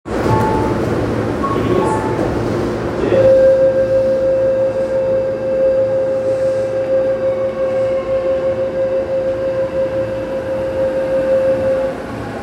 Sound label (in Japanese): 千代田線北千住北